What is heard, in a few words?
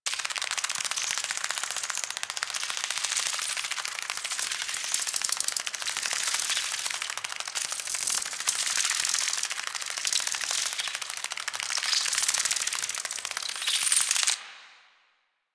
Infested Ashen Move Loop; Warframe